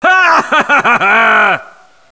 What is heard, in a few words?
laugh; cstrike